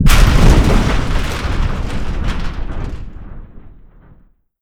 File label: explosion sub hit shake